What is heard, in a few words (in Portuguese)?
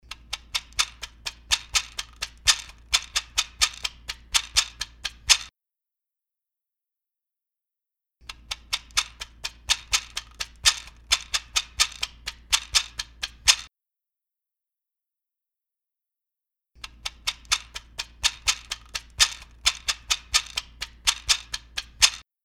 CARACAXÁ